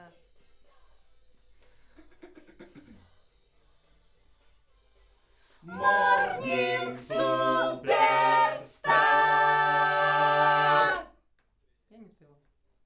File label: Schoeps AKG, piano